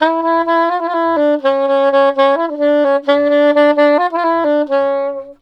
SAX, Balkanish Traditions

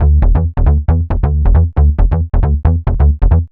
Stat Bass E, uk garage, 136bpm, Bass; Lines n Loops

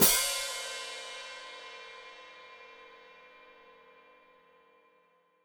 Hat Closed Open, Hi-Hat

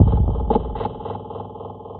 Dark Beat, Dark Organic Processing; Sonic Foundry (Sony Creative Software); Loop Noir

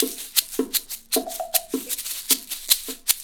Roots Reggae; Sounds Good - Roots Reggae

